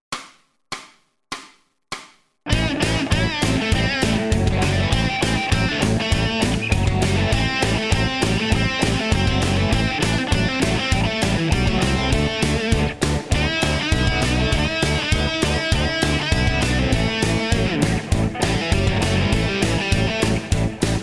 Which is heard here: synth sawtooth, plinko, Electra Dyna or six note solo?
six note solo